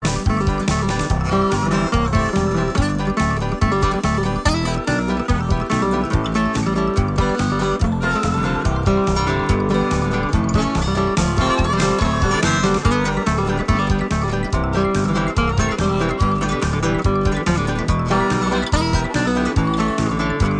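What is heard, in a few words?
veselaya detskoteka veseloe kantri, cheerful kids' disco, cheerful country; crazy cowboy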